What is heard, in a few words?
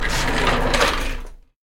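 Short Chair Roll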